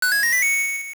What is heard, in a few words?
Heart Container; Get or complete a new Heart Container; also playing the Mystery Flute; Zelda Oracle